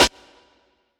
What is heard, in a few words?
00s airy snare single hit g sharp key, Royality free acoustic snare sound tuned to the G# note.; snare drum